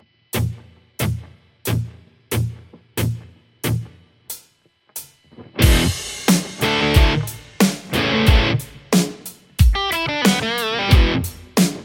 les paul; overloud

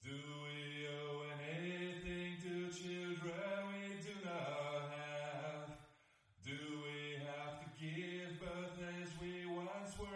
vocals lead reverb

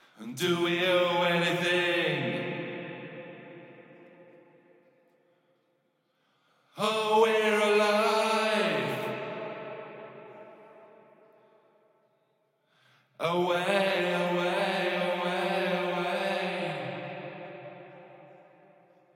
vocals back; finalplug

